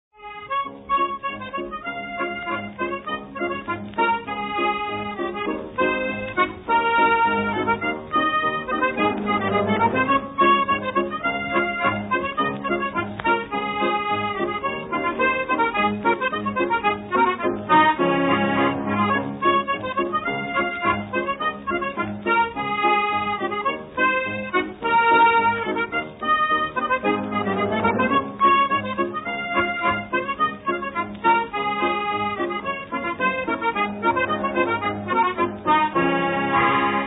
Accordion Scotia Quickstep Flowers Of The Forest We Canna Leave The Old Folks Now